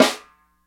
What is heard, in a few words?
Round Snare Single Hit D Key